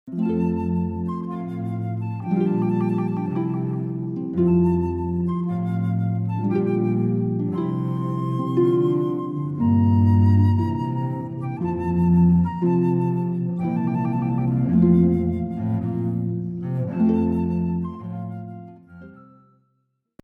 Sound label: Slow Air, harp flute cello; Water Music, Handel